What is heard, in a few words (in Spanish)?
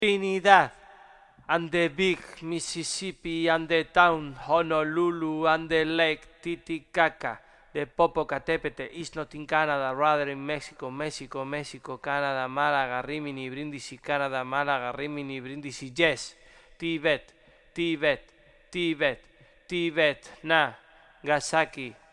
FUGA GEOGRÁFICA BAJOS